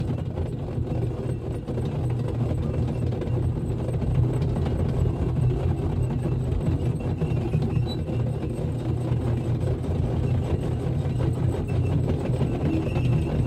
sound Spike Wheel; freedom fall

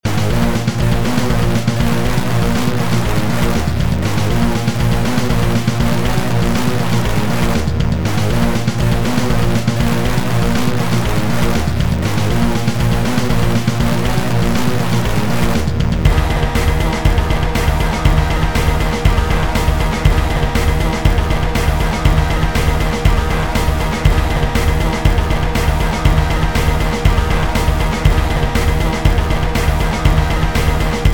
Industrial; Project Warlock; 2018 pc; Quack

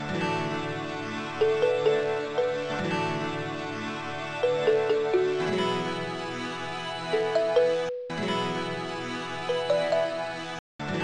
Magic drum; Go, Diego, Go! Safari Rescue; DS

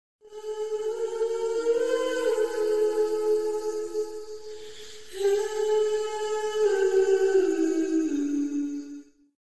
Lose; Project Reality BF2 1.6

